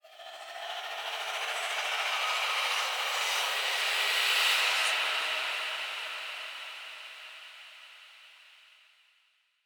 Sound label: Hand Whispers; Ballas; Warframe; Cinematics; Apostasy